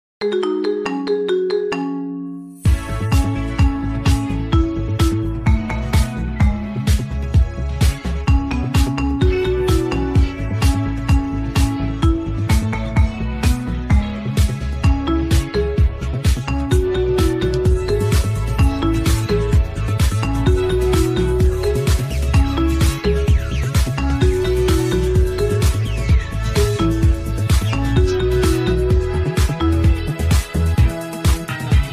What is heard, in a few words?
Marimba Remix